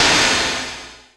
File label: IND